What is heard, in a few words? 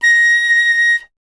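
flute piccolo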